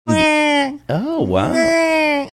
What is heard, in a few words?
making baby crying sound